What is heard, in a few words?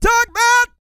SING; Spectrasonics; Vocal Planet Vol.1 Gospel; akai